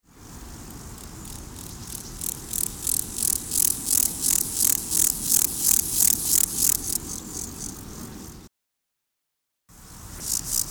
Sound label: chorthippus montanus, heuschrecken